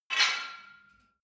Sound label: barbell weight rattle medium, fitnessstudio; sport freizeit